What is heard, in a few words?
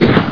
pistol